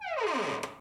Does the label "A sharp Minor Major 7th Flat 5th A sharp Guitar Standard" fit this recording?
No